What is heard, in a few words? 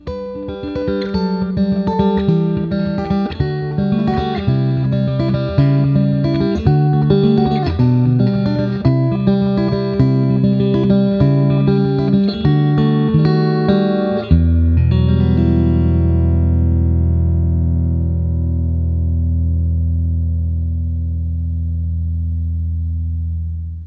56 neck middle, pickups